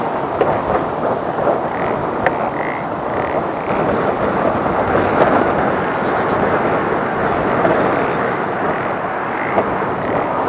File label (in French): pelodyte ponctue; fresnes